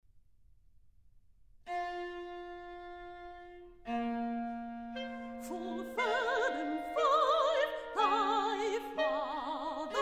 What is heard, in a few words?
for voice flute clarinet viola; William Shakespeare; Full Fathom Five